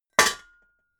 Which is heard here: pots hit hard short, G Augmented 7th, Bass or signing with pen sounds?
pots hit hard short